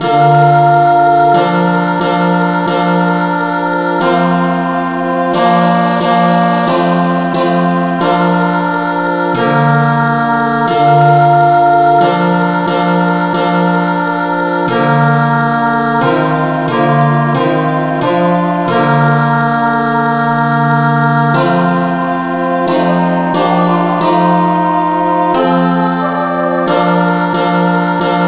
novocal

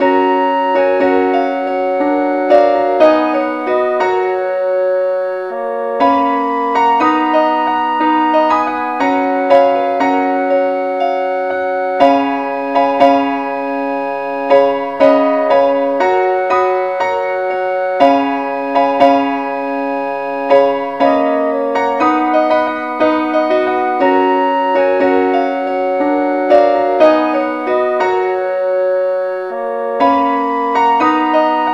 keyboard key B

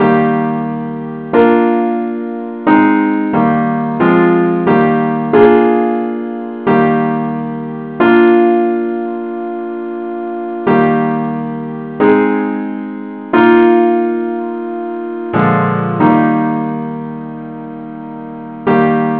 simple Acc key ees; Gwalchmai; Glory be to God on high

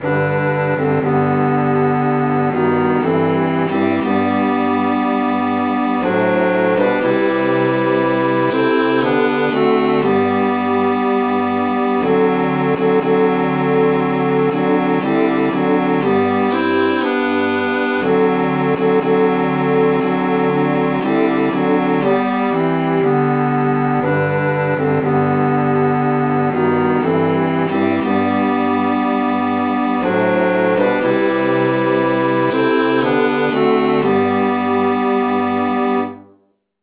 traditional key C

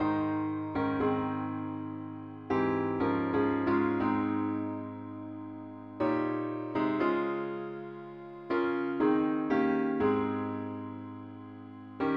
parts only key aes

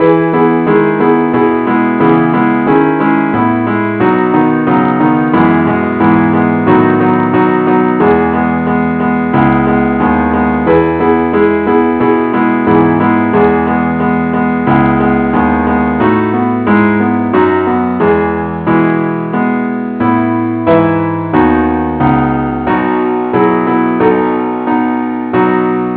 keyboard only, key e

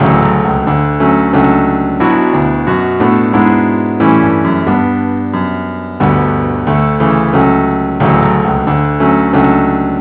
keyboard only

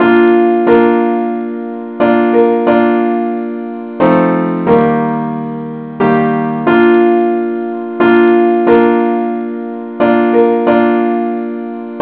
simple acc key bes

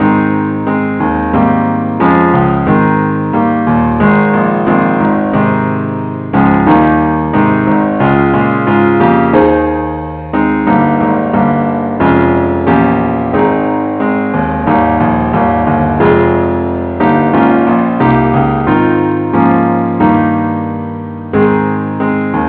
keyboard only key aes; christe Sanctorum

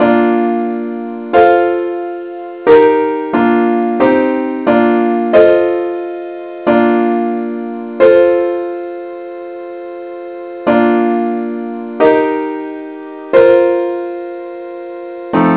simple Acc key bes; gwalchmai; GLORY BE TO GOD ON HIGH

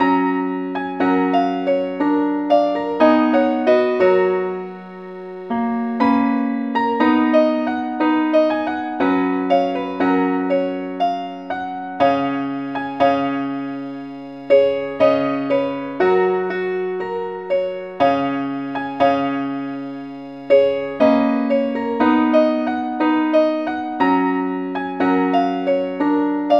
keyboard Only

